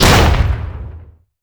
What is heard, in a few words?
Hit Med, Damage; DCS